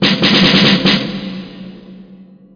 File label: snare riff; SpecOps2MPlayerDemo; Music